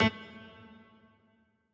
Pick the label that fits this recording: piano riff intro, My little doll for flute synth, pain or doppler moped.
piano riff intro